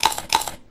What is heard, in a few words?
BITE, sharks; sloodle; gaming